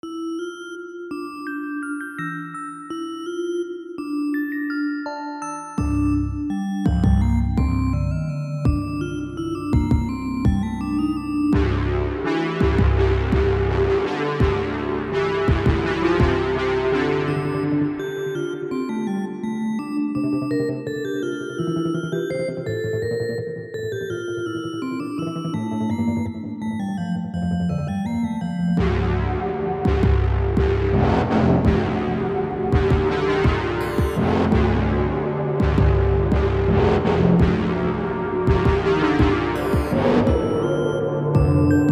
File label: ice toads